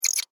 Insect Voice Chirp, Vocalization, Bug; Animal

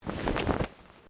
Clothing Shirt Cotton Move; Movemement sounds for various articles of clothing; Dressing Sound Effects

